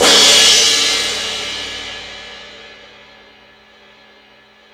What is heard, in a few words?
Crash 2, drum machine